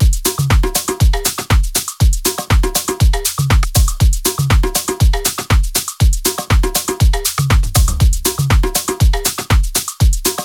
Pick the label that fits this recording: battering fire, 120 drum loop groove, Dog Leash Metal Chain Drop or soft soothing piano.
120 drum loop groove